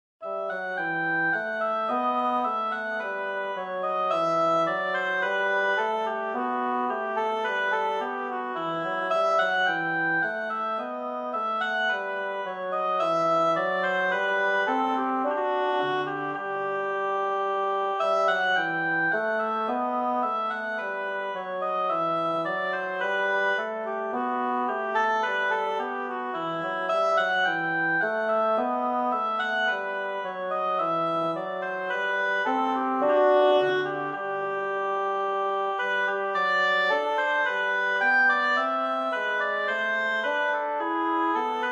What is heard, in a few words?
bourree in e minor oboe and bassoon; bach